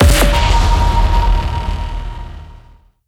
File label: flag shield